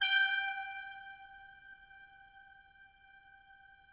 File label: brass G5 R, Yamaha VP-1; OKT.BRASS; Music Store